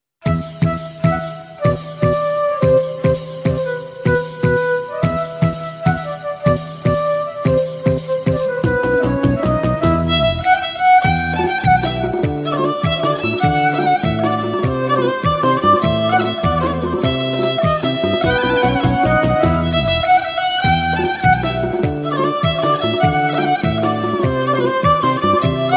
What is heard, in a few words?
Remix Violon